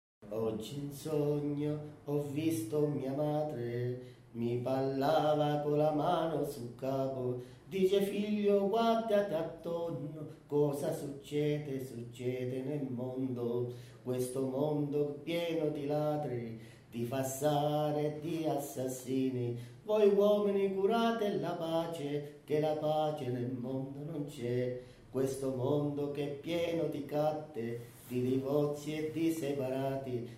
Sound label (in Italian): Canzone